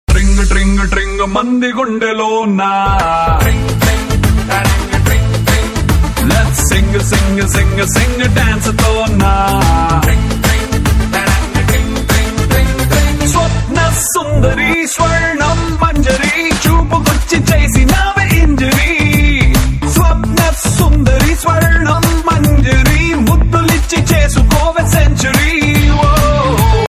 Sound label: Tring Tring Ringtone; Jai Lava Kusa; Telugu